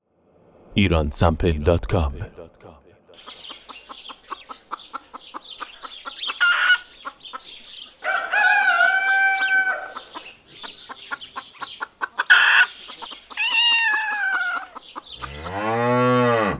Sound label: farm animal